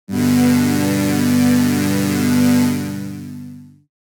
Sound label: Synthwave PAD MACHINE, jup8